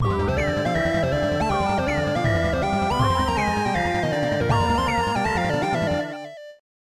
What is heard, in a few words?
Challenging Stage Clear